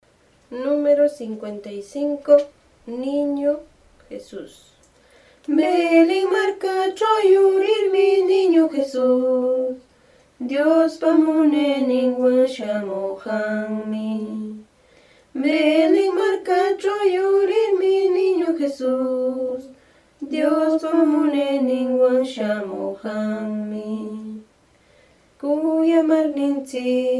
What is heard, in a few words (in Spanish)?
cancion